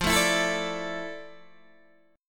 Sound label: F Major 13th Guitar Open D